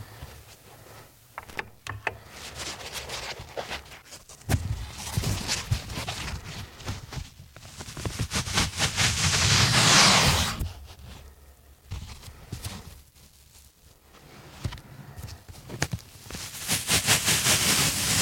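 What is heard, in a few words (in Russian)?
куски пены трутся друг об друга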